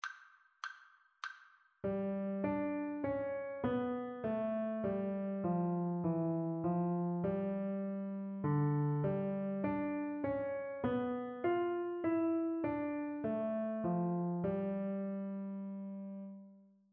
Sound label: melodic dictation; ear training